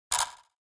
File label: Button Thin Metallic